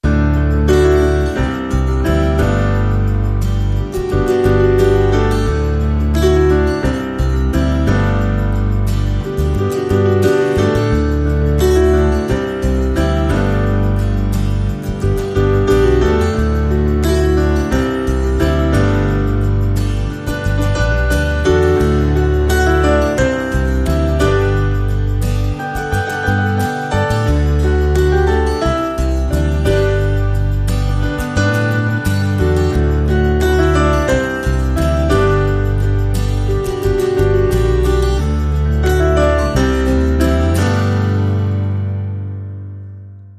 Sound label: Easy, No Drums